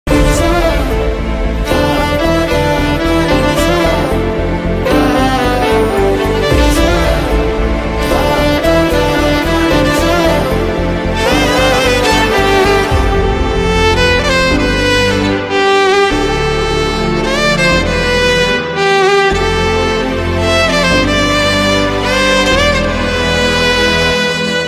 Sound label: reprise bgm